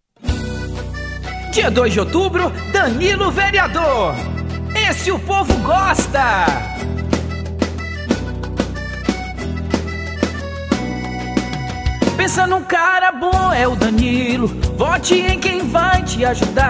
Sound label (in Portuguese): jingle; sapequinha; jingles ferrary